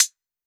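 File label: TR808 closed hi-hat, Roland SH-32 Drumset; Drumset 01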